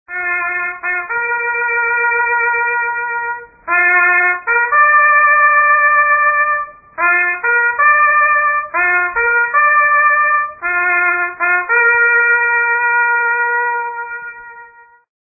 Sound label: Taps